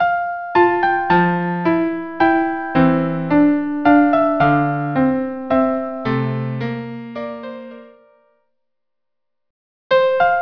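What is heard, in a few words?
gavotte in f violin; corelli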